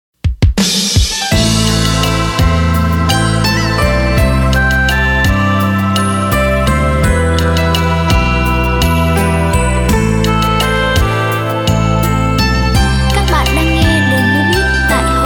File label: beat, playback